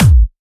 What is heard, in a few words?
Trance Kicks, Bassdrums